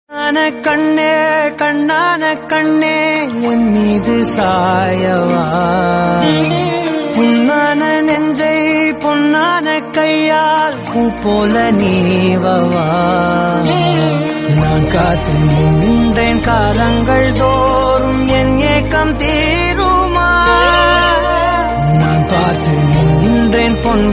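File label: sad background music emotional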